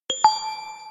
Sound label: Drop, notifications